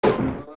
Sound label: Sign Bounce; zelda; OOT